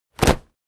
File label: Impact Hard, rocks & dirt